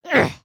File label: Run; Lego Strombie; creatures organic